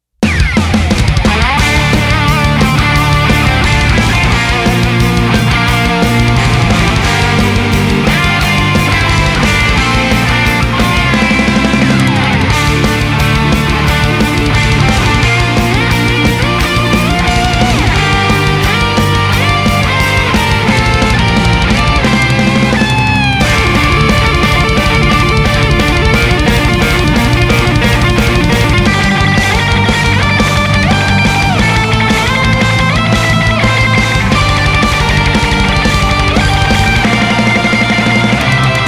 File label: Victory Sting; broforce the soundtrack